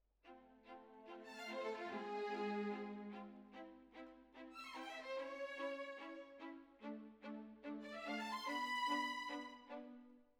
Violins Over

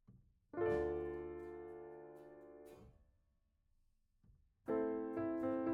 Piano

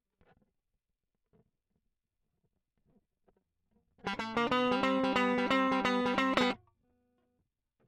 Guit Solo Line

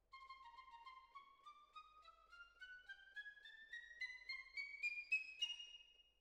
Violin Solo

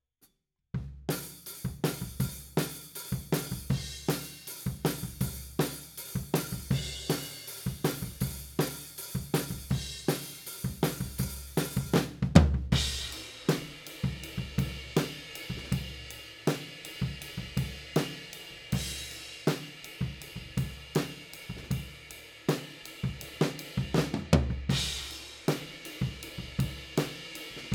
Floor, 160 BPM

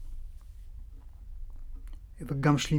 Tape GTR

